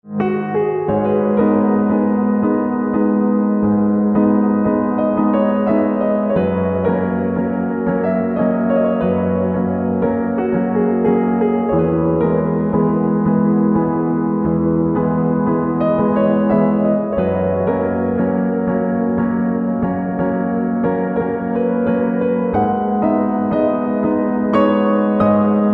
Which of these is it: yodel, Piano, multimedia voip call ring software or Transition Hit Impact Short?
Piano